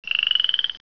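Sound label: Frog chirp; Triazzle 1.0 Demo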